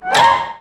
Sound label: A#3 FLUTE; Northstar - The Wizard VOL-1; WIZARD SYMPHONY